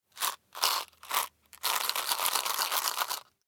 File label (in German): Lutschbonbon